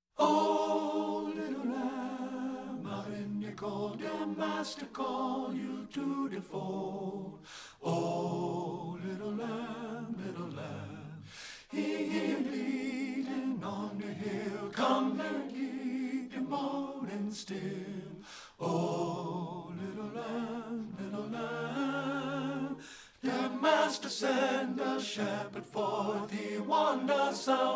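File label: tenor, choral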